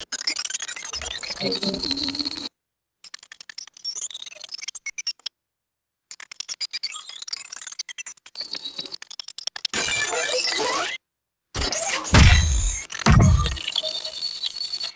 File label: sci fi metallic granular ui chirps